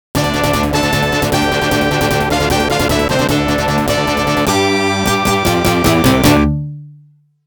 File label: Operation Start; TAITO SOUND BULLETS (2025)